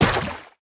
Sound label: mech armor land wet; Factorio